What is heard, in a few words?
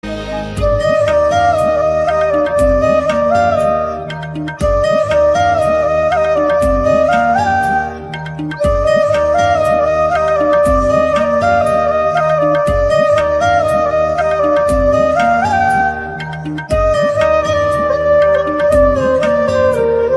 instrumental ringtone